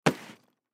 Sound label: Duffle Bag Full Toss Slide Cement; Slides (Sound Effects:Objects); Foley; Blastwave